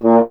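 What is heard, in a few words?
French Horn; Miroslav Vitous; Solo Instruments VOL-2; Solo lnst. II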